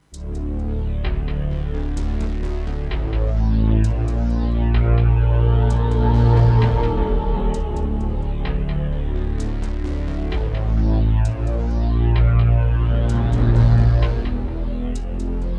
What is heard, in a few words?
Roland SCB-7; Descent II - Briefing